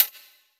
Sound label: Open Hihat; Vengeance Samples; VEE Cymbals